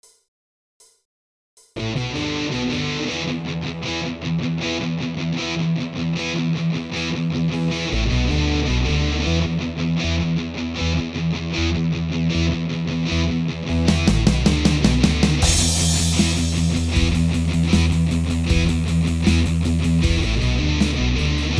Rhytm guitar Cover

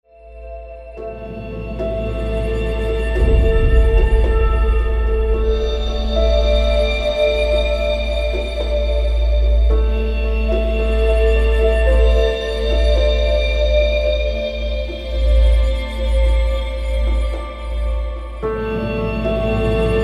Ambient

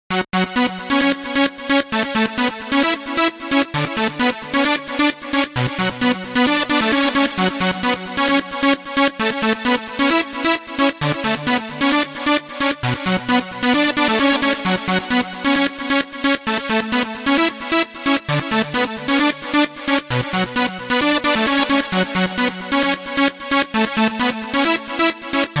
synth

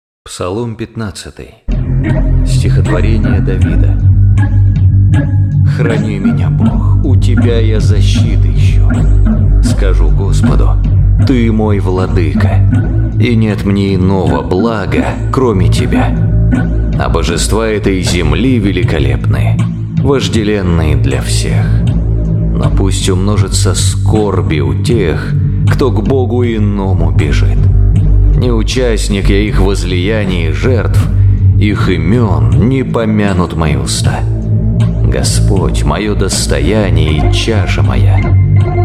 Amb Instr; ПСАЛТИРЬ, псалом 15 (современный перевод)

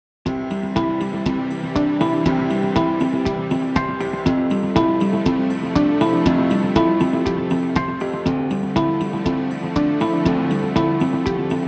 Happy Corporate Background Music

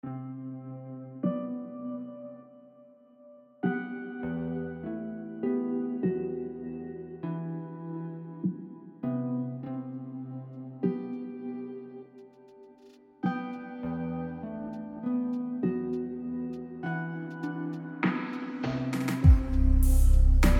Irregular Heartbeat - Respiration

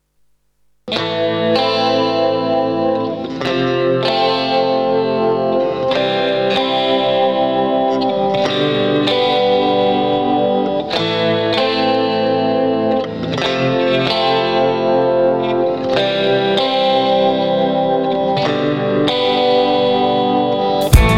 Groove

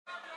Mysterious Progressive Trance Mix

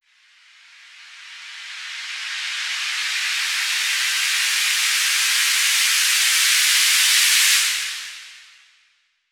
Riser

